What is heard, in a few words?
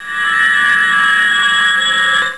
transport; DroidQuest